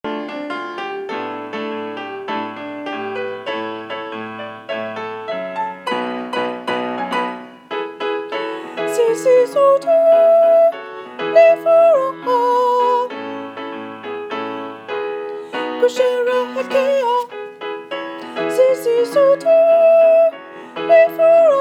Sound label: Descant Alto